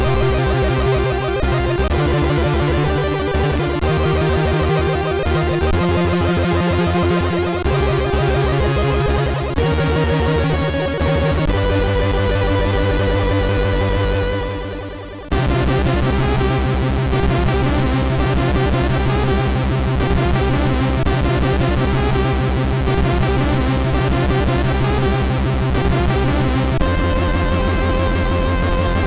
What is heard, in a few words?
End Theme, MOS6581R3